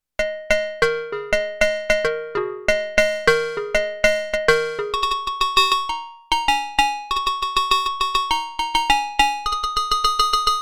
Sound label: Agogo Bell, Roland JX-8P